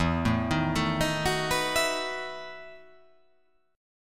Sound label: E Major 7th Suspended 2nd Suspended 4th